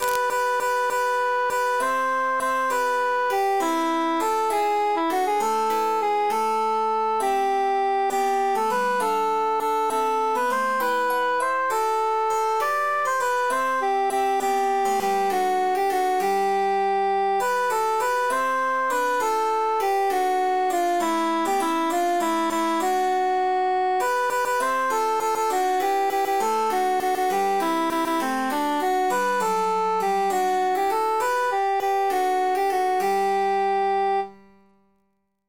altus emphasized; though philomela

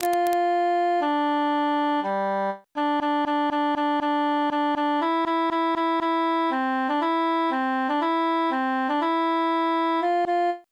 altus solo; el grillo

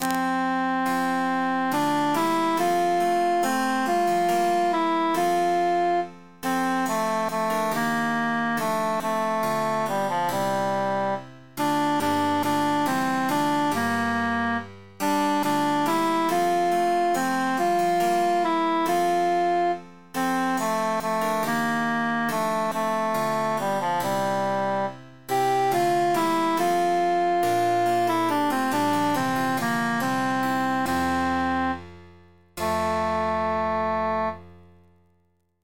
altus emphasized; innsbruck

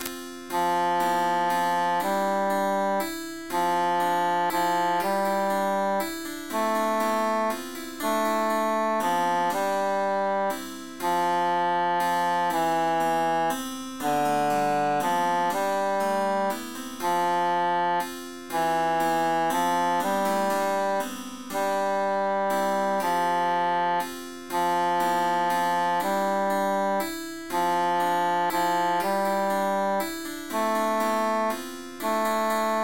tenor emphasized; li jalous par tout sunt fustat tuit cil qui sunt enamourat veritatem

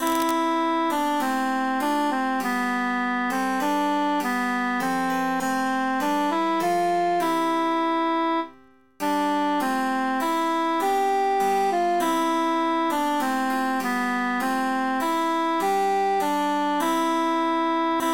altus emphasized; we be three poore mariners

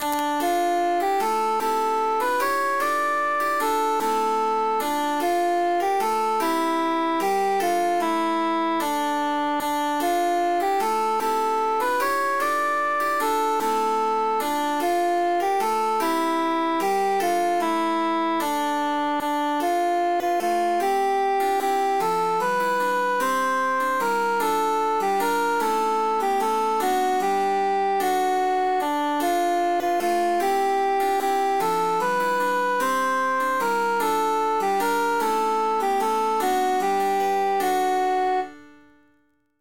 three country dances transposed cantus emphasized

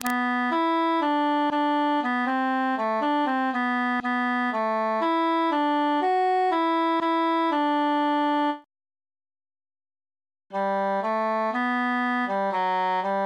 tenor solo

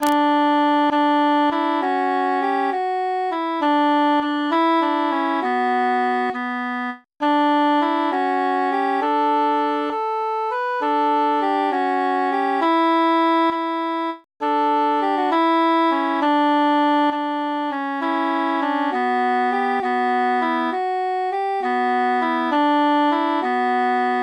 transposed cantus mute

